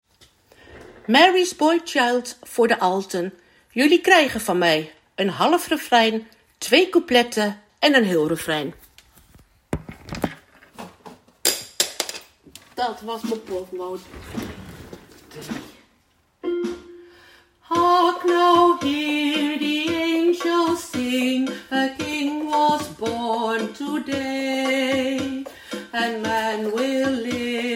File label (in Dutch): Alten